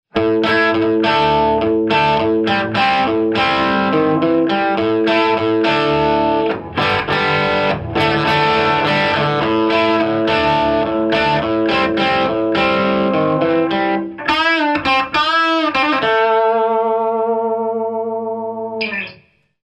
jubilee, amps

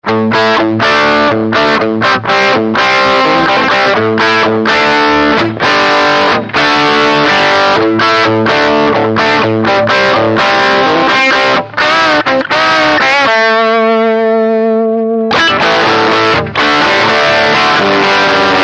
hiwatt C50 normal; amps

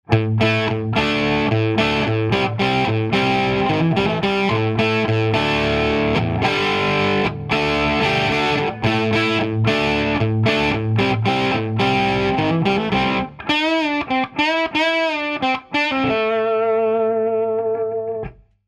hiwatt c50 combo, amps

